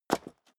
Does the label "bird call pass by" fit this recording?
No